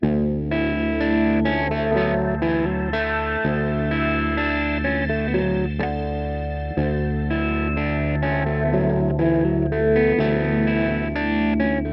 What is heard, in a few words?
Guitar Sweep the Room; 5: Sweep the Room (Automated); Temperance Lite